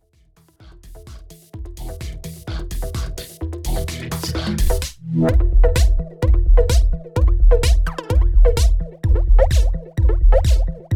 Denon DJ Flanger

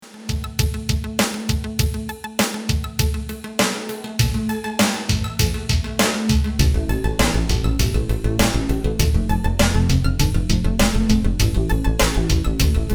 Eventide H3000 Swept Combs Drums Synths

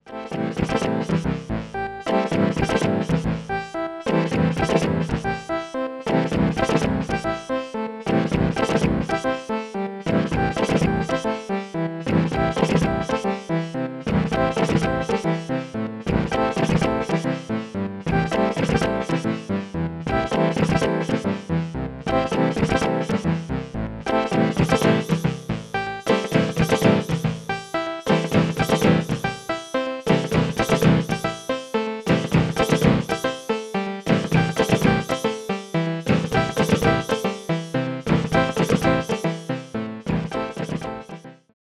Rossum Electro Music Morpheus Peak Sweep

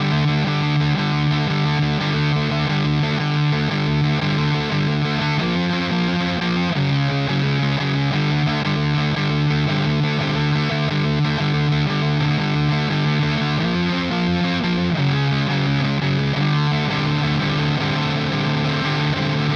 Ripped Speaker Fuzz Saturated and broken; EHX

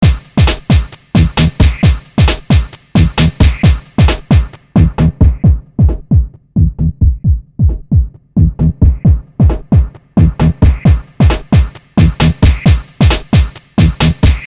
EXS Loop 12dB LP Filter

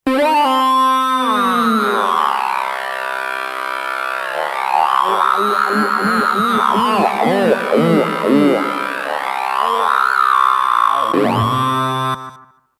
Guitar; V-Synth

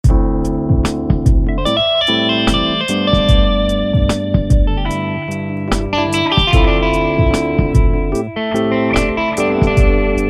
Solo Chorus Guitar; GuitarRig; StrayGDog

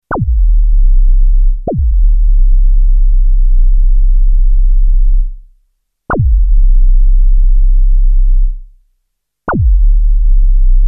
CybersoundT1 A lowest tone full resonance

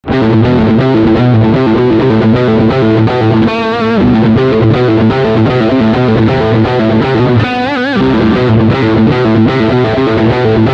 Riff Humbucker Front

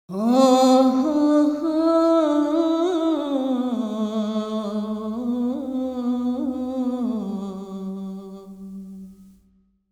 Normal Plate 2_7 Sec 100 Decay

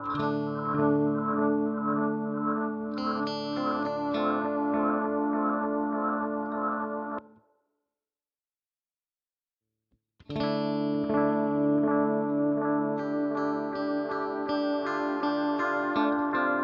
Chase Bliss Audio Thermae Modulation

